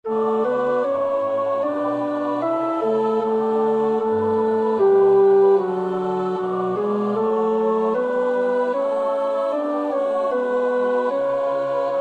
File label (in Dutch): tenor, koorpartij oefening; Bach (Johann Sebastiaan); BWV 174; Herzlich lieb hab ich dich, O Herr